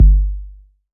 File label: BD, Waldorf Blofeld